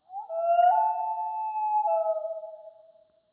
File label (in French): cri du plongeon huard loon wail